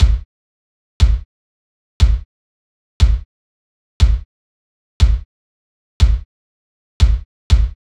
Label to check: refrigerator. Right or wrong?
Wrong